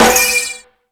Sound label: House Machine, E-MU Pro-Cussion